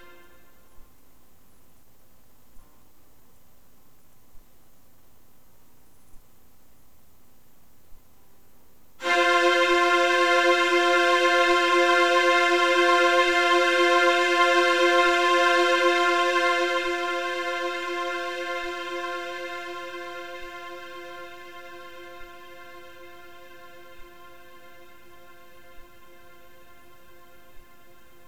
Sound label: STRINGS Recording, Back Tracks